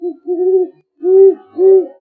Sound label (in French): chouette